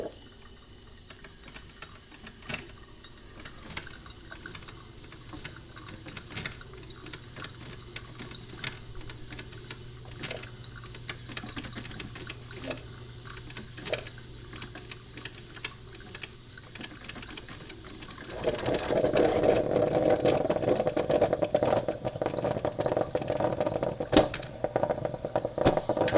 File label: Coffee Brewing, binaural